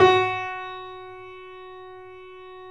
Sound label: 3D Pianos Yamaha C7; E-MU Producer Series Vol. 5